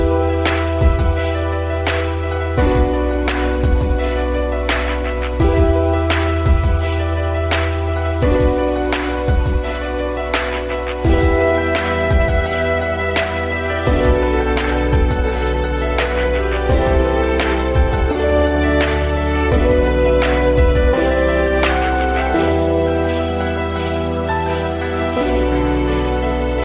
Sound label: instrument ringtone